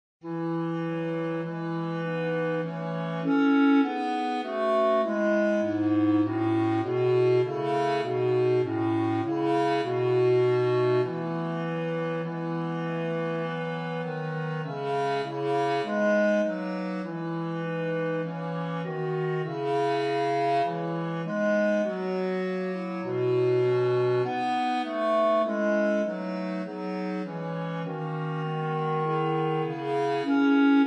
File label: bass